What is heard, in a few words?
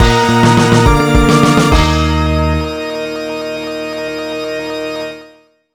Jingle Bossko; zombiez seeker; nds